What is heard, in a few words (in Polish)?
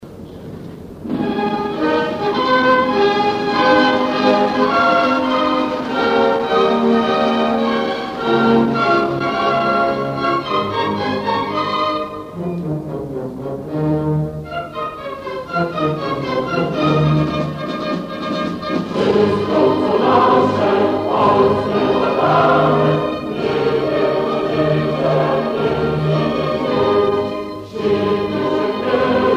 Hymn harcerski